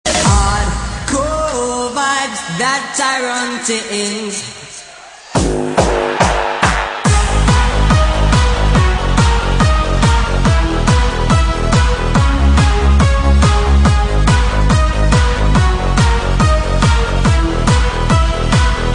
hardcore vibes

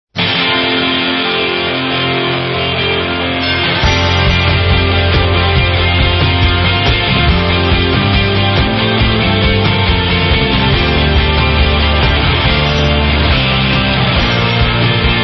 instrumental

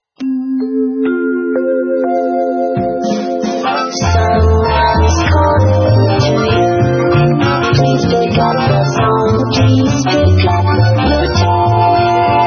jazz

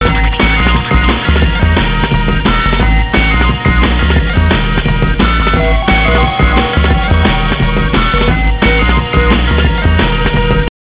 drum bass

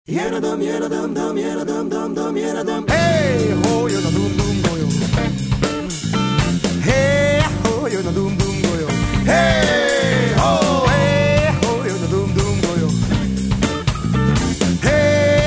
blues

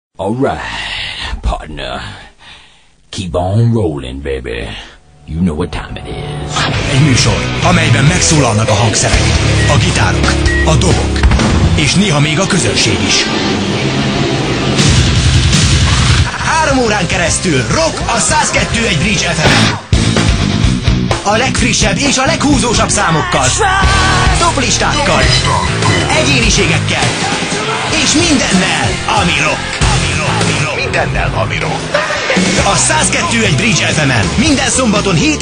rock

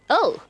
ouh

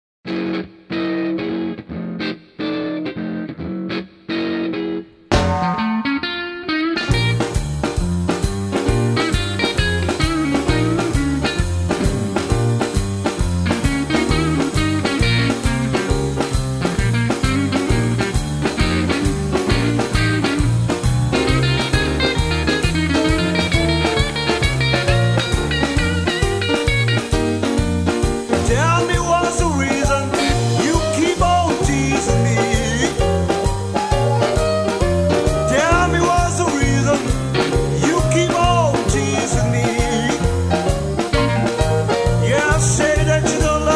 blues

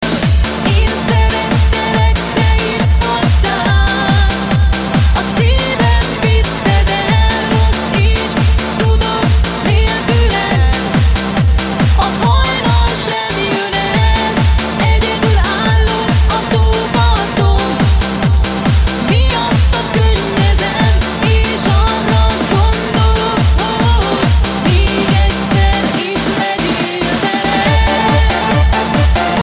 fun groove

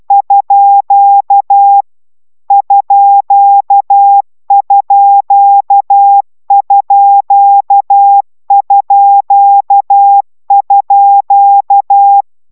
cw; amatorradio; taviro tanulas

